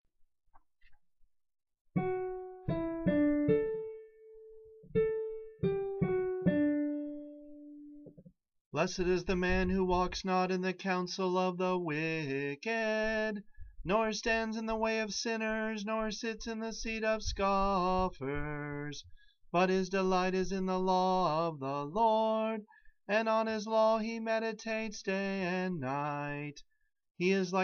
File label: Psalm 1 (chant, tone A); ESV